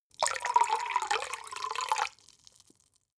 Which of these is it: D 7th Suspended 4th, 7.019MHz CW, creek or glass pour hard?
glass pour hard